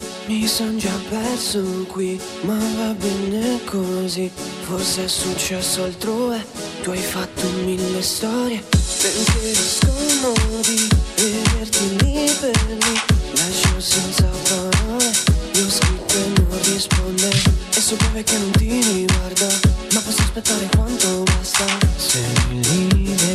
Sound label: Dancefloor Groove